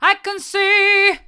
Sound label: i can see hard, vocal